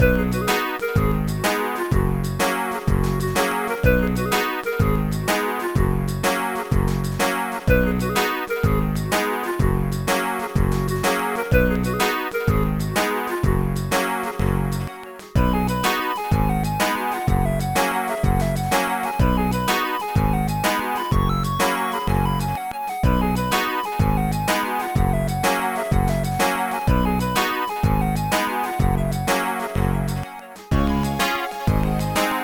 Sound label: polka waltz; Trackers; Modland